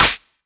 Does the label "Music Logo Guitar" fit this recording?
No